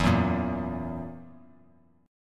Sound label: E Suspended 2nd Flat 5th E down Bass Standard; strummed; JGuitar